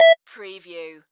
Beep Modulated Single; sound-effects-bible

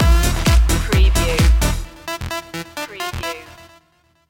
k pop fever stinger

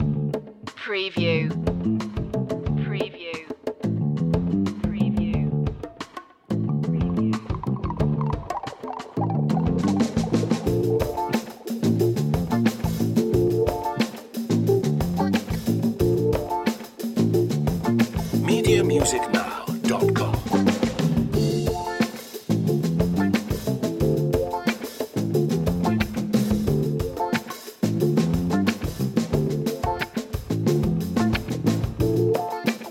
drums guitar and bass

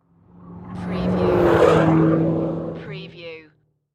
Car Race Car Pass By Fast

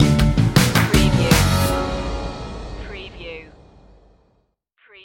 funky hop stinger; rhythm and blues

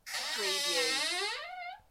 Door hinge squeak; beanstalk audio

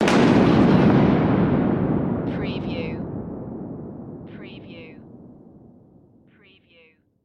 Thunder Clap

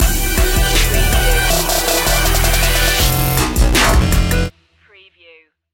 anomaly stinger

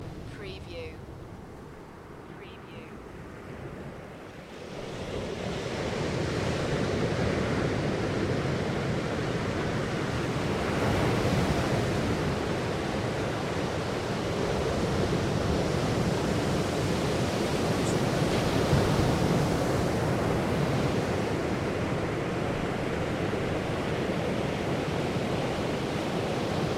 Crashing Waves Loop; beanstalk audio

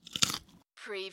Bite into carrot, bite crunch, munch, chew, chomp

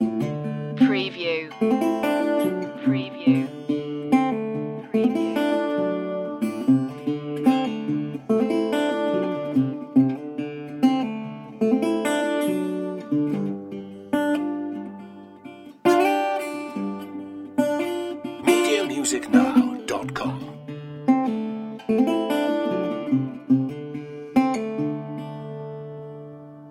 sand and rust loop

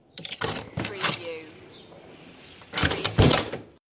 Old Wooden Window Open and Close

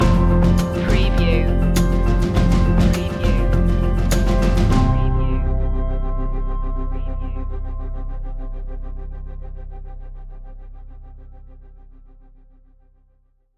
roots stinger

